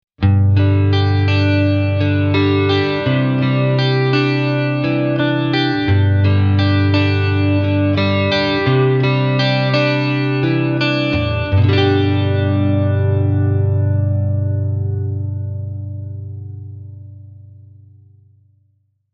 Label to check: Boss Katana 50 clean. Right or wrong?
Right